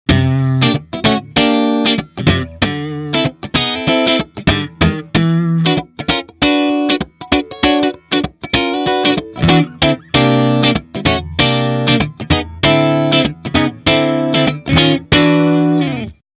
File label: COYOTE Funk; TEYE